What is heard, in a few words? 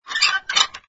Line Squeak; conservation catapult; project green